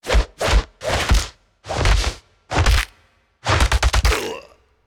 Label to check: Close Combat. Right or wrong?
Right